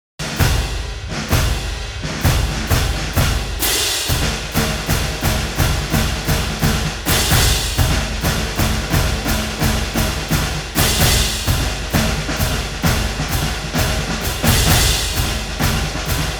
Drum Roll; wartran troopers worldcombat soundtracks 2004

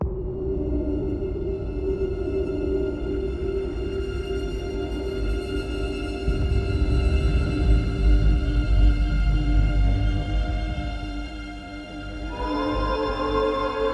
Suspence; apex legends season 12; Bunker; EndAnimation

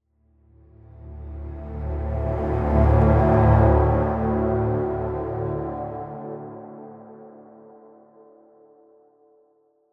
Tone Organ; Tone Organ Explore Match Intro 6

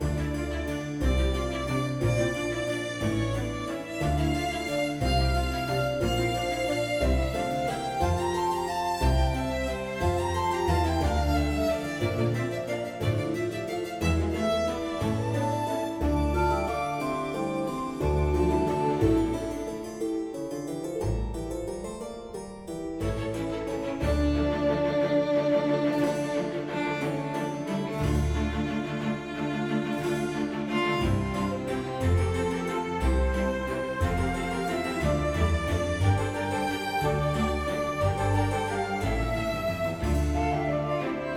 ballroom; Shadowhand; macos windows gamerip 2017

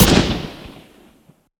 Sniper Shot

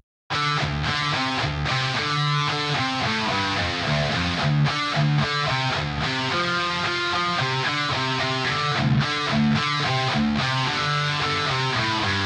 Instrumental Guitars; nowhere station

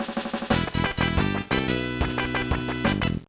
Jingle, gba

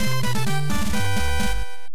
Stage Clear; Castle of Dragon; NES

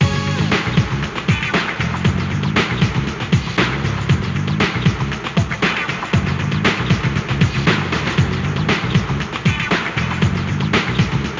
Hiphop; MLB Power Pros 2008

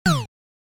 Snow-Bee Squashed; Pengo; arcade

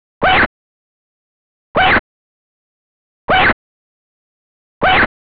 King Gorilla Roar; Oretachi Game Center Zoku; Crazy Climber

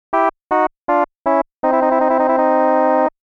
Lose; Estpolis Denki I II Super ROM Cassette Disc in Taito Vol. 1

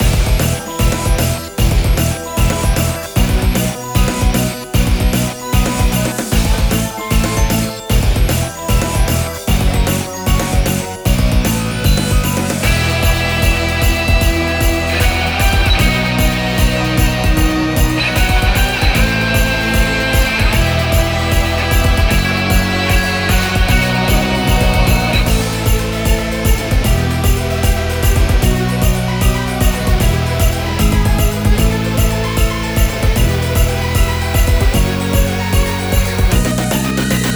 Level Ice Loop; running gods

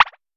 Jump Button On

